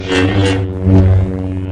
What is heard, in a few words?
saber; claus